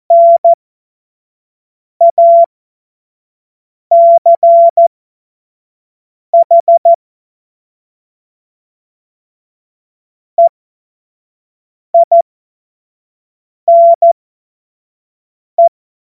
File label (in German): Witz, cw morsen; Tempo 25 F70